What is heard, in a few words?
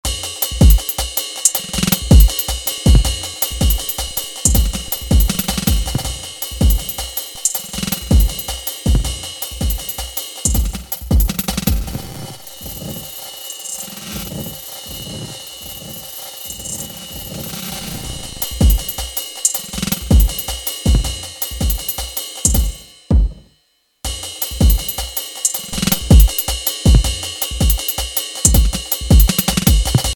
reverse